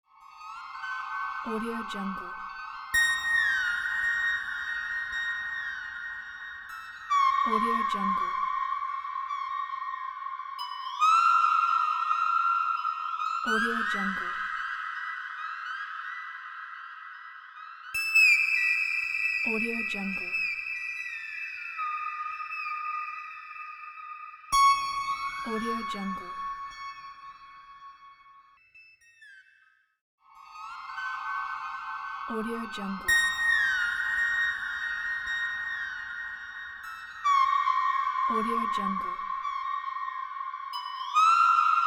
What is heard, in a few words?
space ambient ambiance